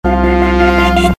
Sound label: sms